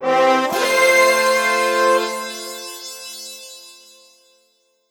Se Btl Victory Lively

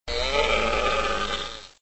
Deer Cry; Extra Lives

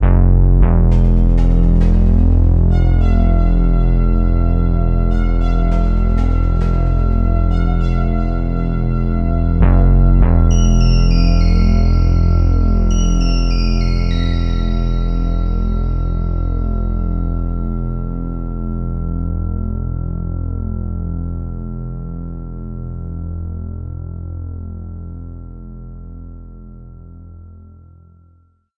Music One Shot

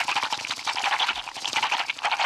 fluke fairy charge loop

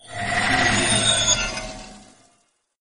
boss dead sound; Arc of Templar

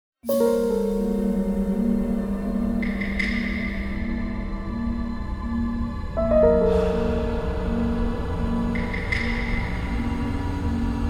Gift Shop Music; Hector Episode 2 Senseless Acts of Justice

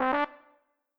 Instr Trumpet; Backpack Hero

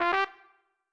Instr Trumpet; Backpack Hero

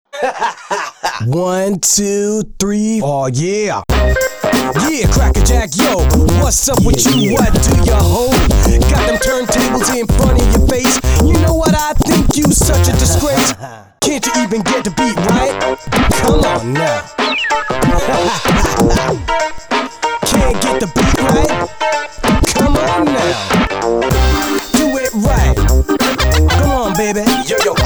scratching; crackin dj

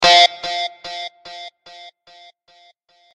Guitar F; Pokemon Reborn E19

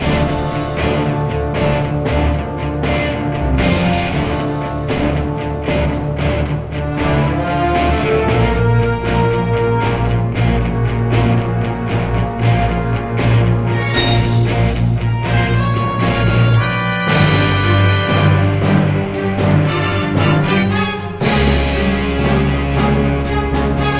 Music; cartoon network punch time explosion xl 2011 gamerip

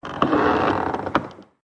Creak

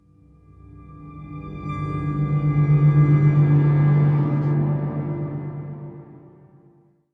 amb strings; Blackfaun

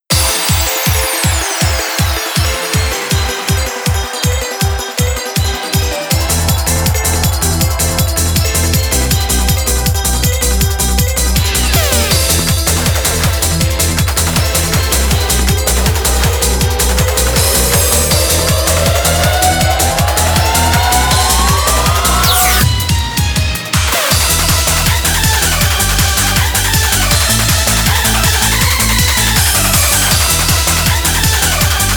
Instrumental, eurobeat

